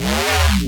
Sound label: Reese Bass F; Antidote Advent